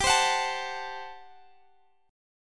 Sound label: G Major 7th Suspended 2nd Suspended 4th; GM7sus2sus4 strummed; x,x,17,17,19,17